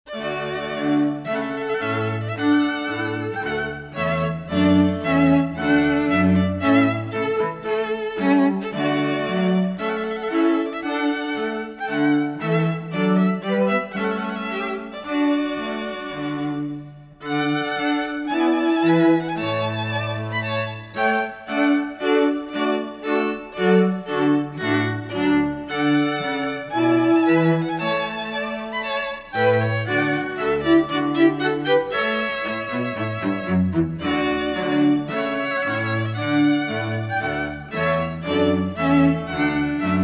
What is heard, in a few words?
Trumpet Voluntary, ceremony processional